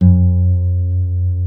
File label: G 2B NYLON, Guitars; Spectrasonics; Hans Zimmer Guitars VOL-1